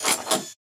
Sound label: Sci-Fi Weapons-Loading Fx; Robobeat